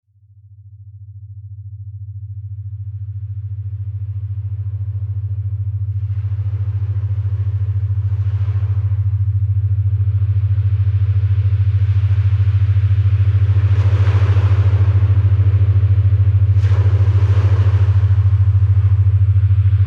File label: Ocean Sound alpha Wave